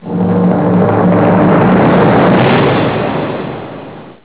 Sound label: drums; knight